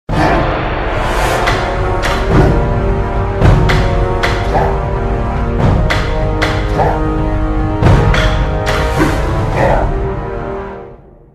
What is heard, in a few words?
pvp victory horde; wow